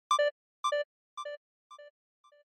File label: Short Accent Delay; ショートアクセント; ディレイ